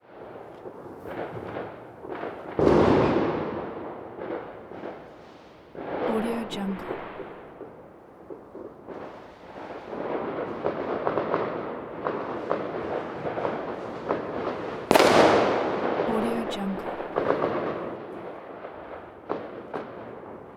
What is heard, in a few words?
firework ambience